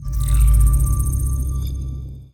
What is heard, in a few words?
ui load